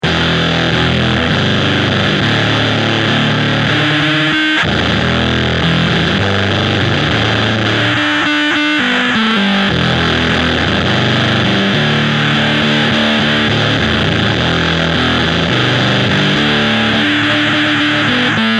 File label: rocker; deathbyaudio; waveformer